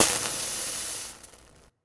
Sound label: Kamikaze Fuse